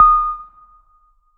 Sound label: FX, 5U Modular; Polyend; Simulacrum Sounds; 1 Shots; Synths